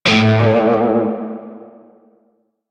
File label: Shawzin Flourish Oct One A; Music; Warframe; Narmer Shawzin